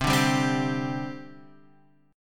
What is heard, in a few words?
C Suspended 2nd C Guitar Half Step Down